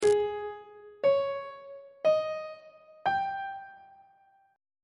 a flat major 7th suspended 4th chord intervals; on treble clef

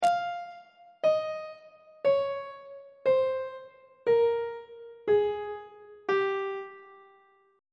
g locrian mode descending on treble clef

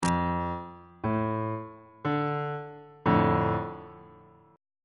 d minor 1st inversion on bass clef

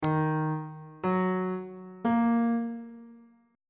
d sharp harmonic minor chord 1 is d sharp minor

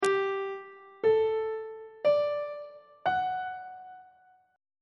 g major 7th suspended 2nd chord intervals; on treble clef

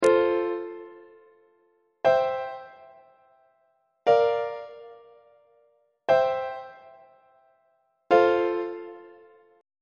f major perfect authentic cadence; on treble clef

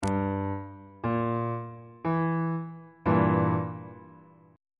e diminished 1st inversion on bass clef

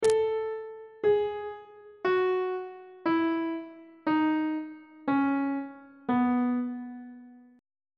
c flat mixolydian mode descending on treble clef

